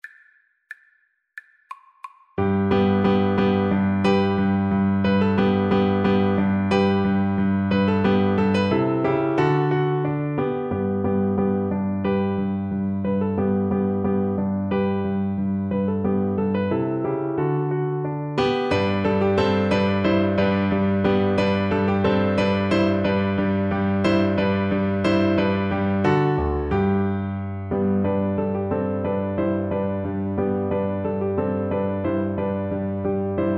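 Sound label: recorder karaoke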